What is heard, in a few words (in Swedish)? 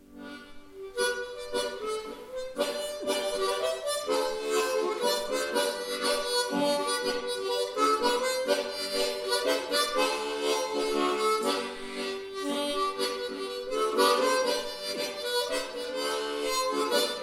Vals i G-dur, munspel; Hampus August